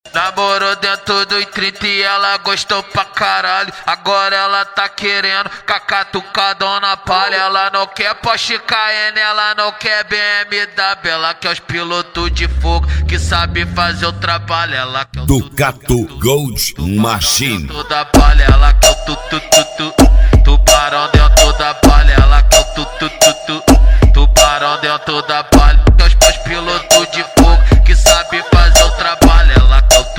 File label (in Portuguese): especial funk